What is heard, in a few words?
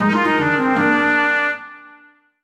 Bad Chime